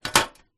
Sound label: household portable camping stove hot plate down on stove